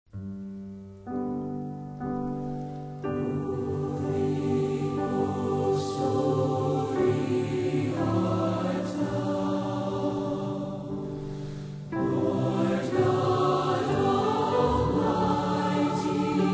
Choir And Orchestra

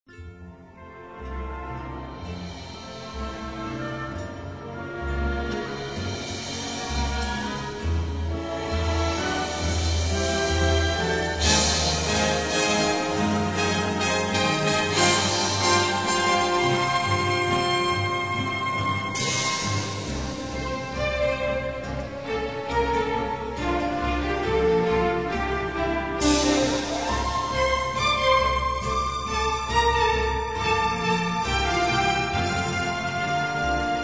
Strings, Christmas

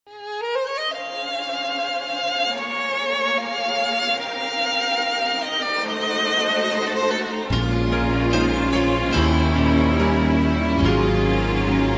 Instrumental Hymns

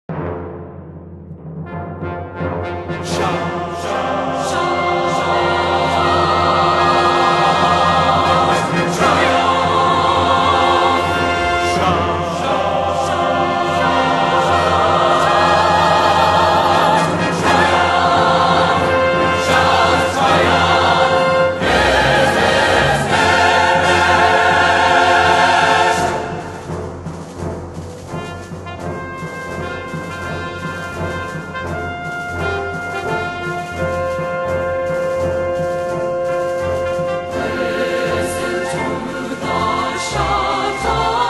Chorale With Orchestra, The Shout Of Triumph

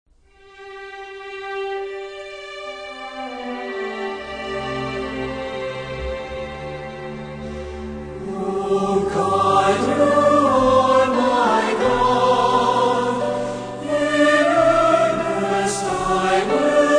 Choir And Orchestra

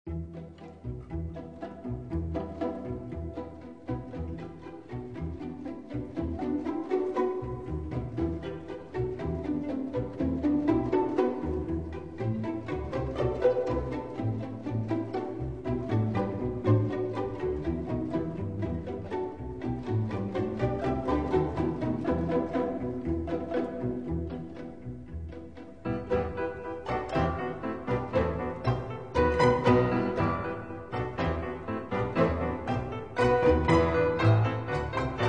Strings, Christmas

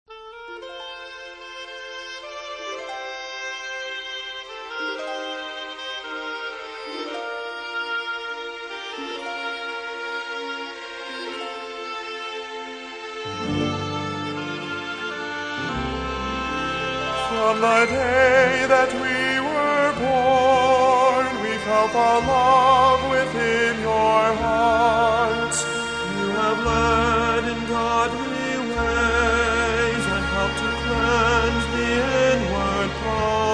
Trio, We Love You

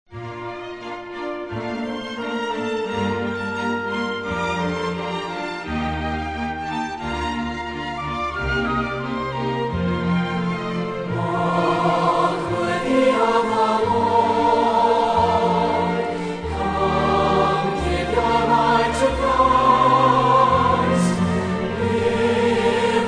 Singers And Orchestra

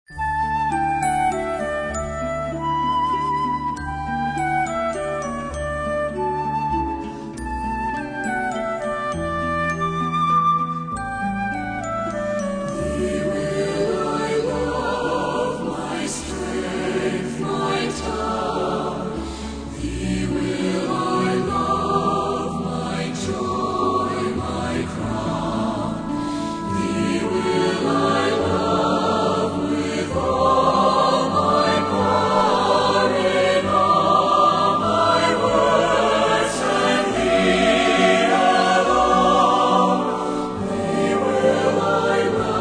Choir And Orchestra

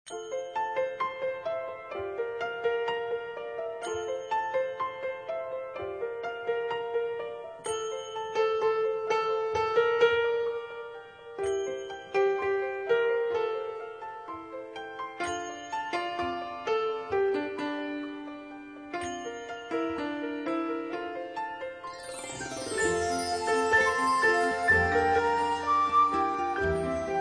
Majesty Strings

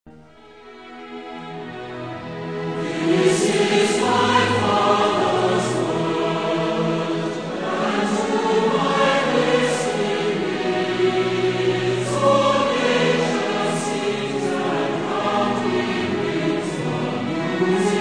Orchestra and Choir, Hymns

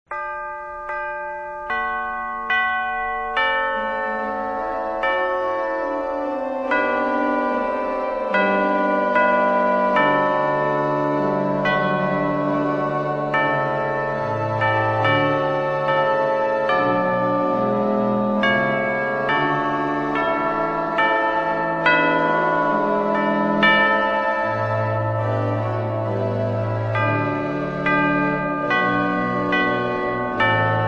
Cathedral Organ And Chimes

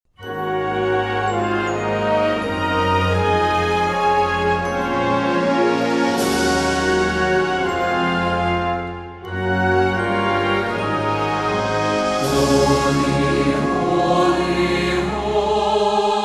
Chorale With Orchestra, Holy, Holy, Holy

